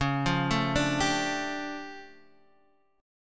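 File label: D Minor Major 11th, D flat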